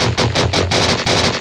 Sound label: Treat Guit, Industrial Nasty